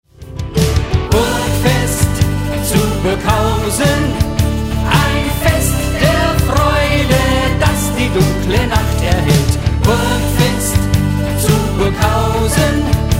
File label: ringtone short; Burgfest